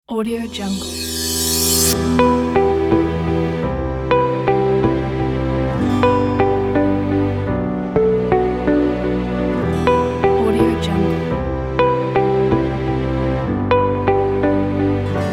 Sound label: inspirational motivation corporate